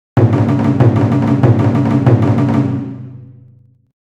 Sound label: 95bpm